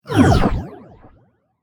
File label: Magical Swoosh; gamerip; shelter 69